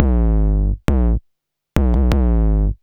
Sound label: 808 Loop BD 85, Roland 808 Drum Loops, 85bpm